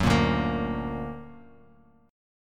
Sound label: D Augmented D down Bass Standard; D+ strummed; JGuitar